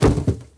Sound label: bodyfall metal